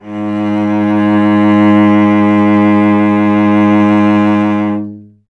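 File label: strings cello G#; Miroslav Vitous; Solo Instruments VOL-1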